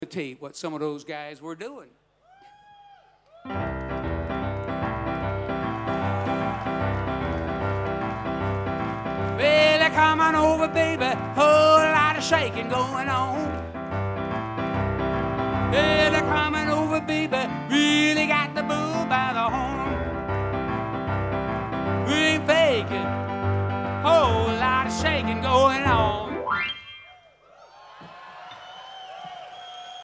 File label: Piano Moment